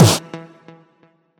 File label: combo power; quatuor